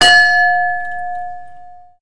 bell ring

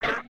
Spider Blink; creatures organic

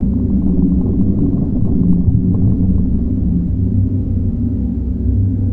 Rumble Ambience; Nursery

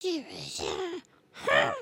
indignant, emotes

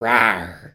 Dragon Emote; emotes

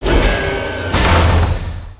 rocket servo movement

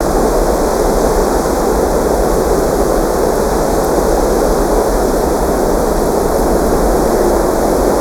Launch Pad Wind Amb; gnarled forest

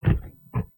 Pet Buffalo Idle Look; creatures pets; Lego

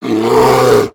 Pets Bear Panda Rage; creatures; Lego

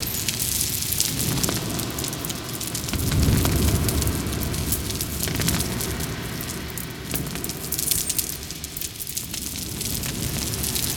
Sizzle Ambience

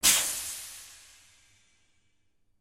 air release; spaceship

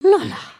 bow; emotes

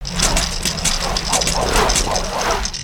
Brute Spinjitsu spin; creatures organic; Skullken

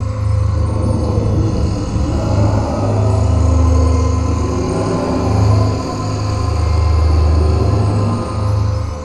Space loop

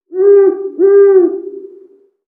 Owl Call; Valiant Summoner

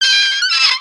Welder nozzle twist; foley

